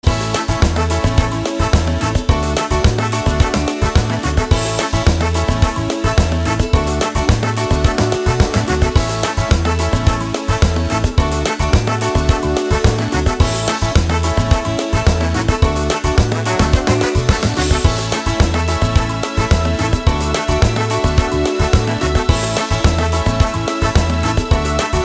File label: samba